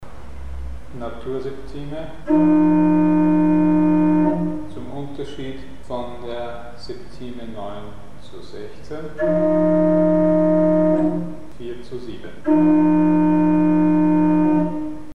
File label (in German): Naturseptime 4zu7, pythagoreische kleine Septime 9zu16; Mikrobuch